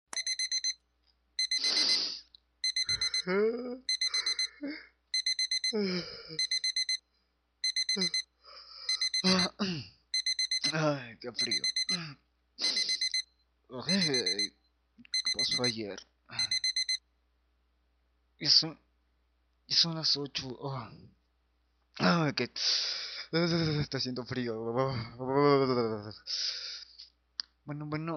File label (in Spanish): cantando en el baño